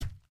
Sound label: heavy taunt chest hit